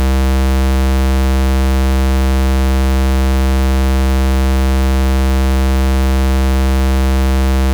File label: square